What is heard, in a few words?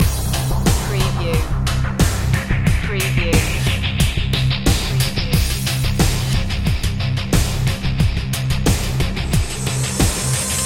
slow jam rock loop